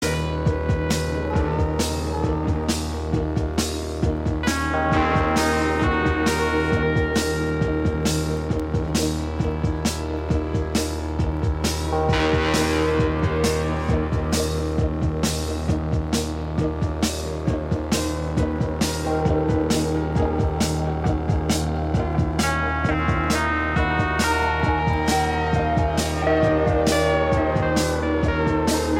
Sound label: Noise Electronic Music